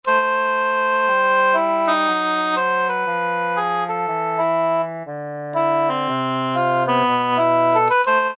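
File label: wind Oboe, E-mu PK-6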